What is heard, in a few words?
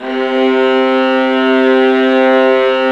ARCO VIOL, Arco Violas